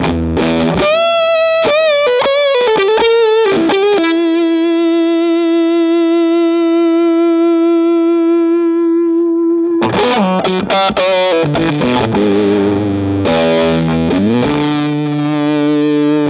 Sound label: strat bridge orange ch1